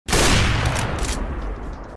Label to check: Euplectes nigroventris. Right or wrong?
Wrong